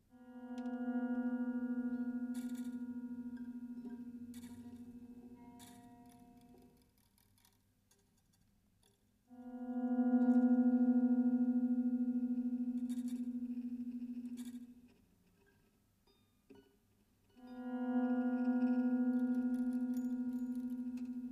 CHUTE ELECTRIQUE; pour guitare électrique; réalisé sur Revox A700 deux pistes

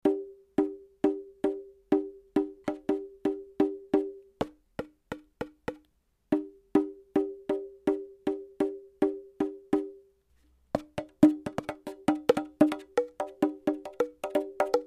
TABLAS AFRICAINS PEUT ETRE; LA KERMESSE DU COLLÈGE

